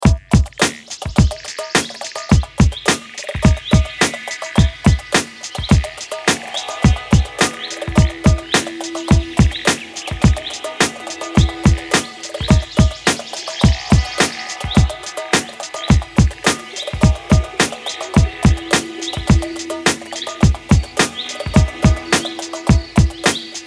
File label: loop; retro detonation; tropico